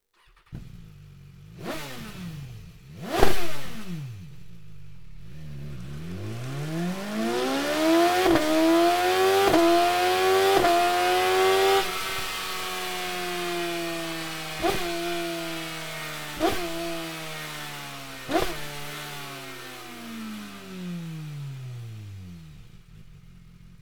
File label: slip on wo insert